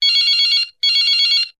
Phone Ringer

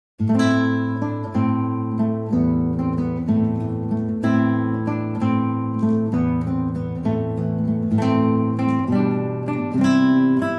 Solo Guitar

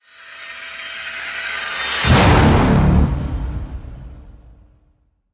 Hit Orch Release The Beast D

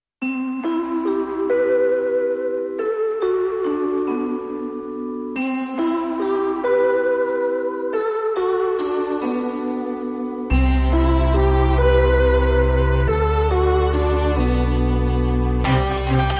Waltz, No Drums

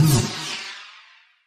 Phased Swirl

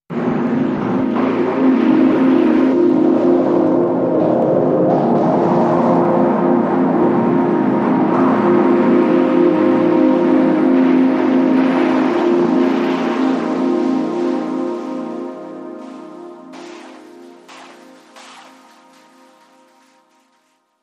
Glitcher Sting

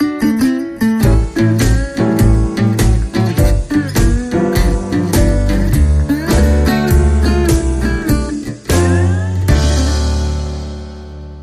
My Cigar Blues, Jingles

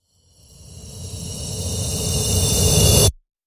Inhale Exhale Reverse

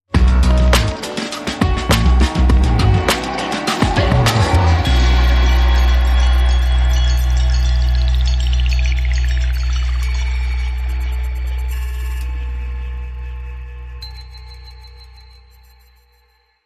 Sting

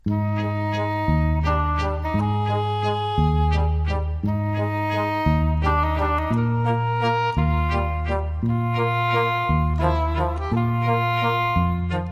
Serenata Waltz

